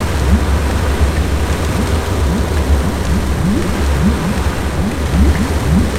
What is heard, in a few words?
Amb Quicksand, ambience; Ninjago